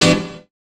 chords

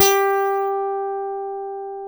EL A GT1 G3; AKAI S-Series CD-ROM Sound Library VOL-7; A GUITAR 2

